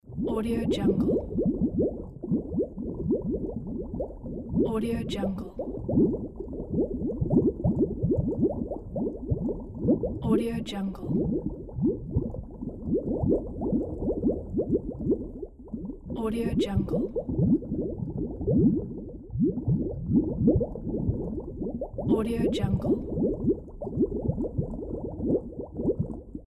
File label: bubbles loop